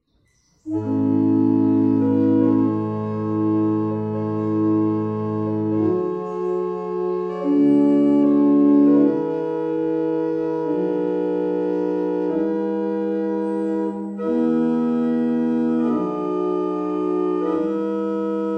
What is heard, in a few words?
Morning Hymn, barrel organs; Raithby